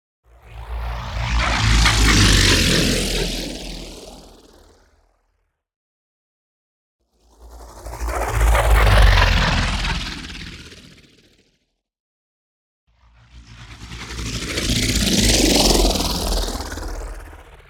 NEON WHOOSH SMALL SLOW Buzzy Swarm; boom library

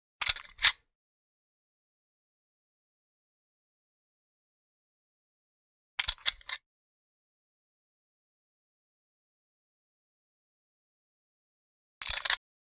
.22 Pistol Magazine Remove; Hollywood Edge; Heavily Armed

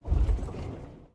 soft road bump; Blind Drive

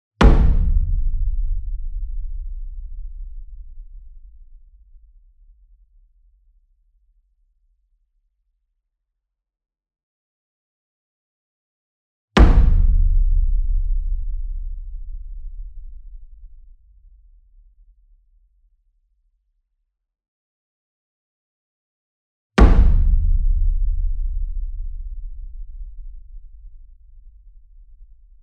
Single Drum Taiko 60 Inch Hit Soft Beater Near; boom library; Cinematic Strikes Construction Kit

